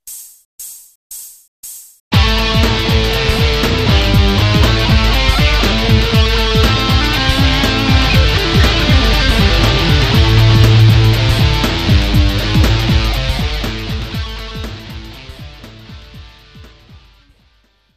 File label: lick, guitar